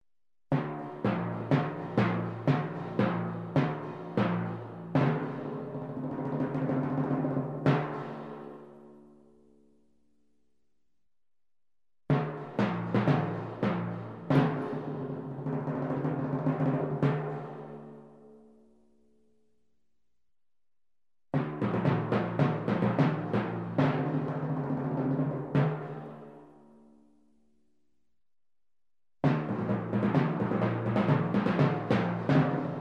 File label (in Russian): zvuki litavri sborka